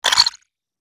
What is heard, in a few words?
Futuristic digital switch turn off